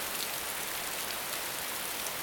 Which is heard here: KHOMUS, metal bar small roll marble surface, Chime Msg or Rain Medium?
Rain Medium